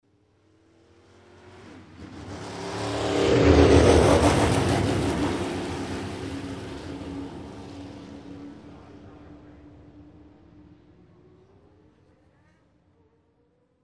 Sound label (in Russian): po bezdorozhju